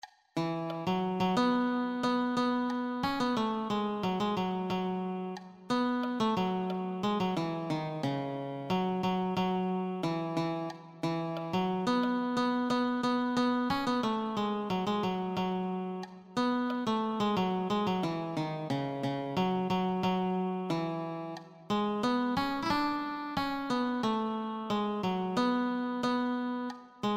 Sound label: guitar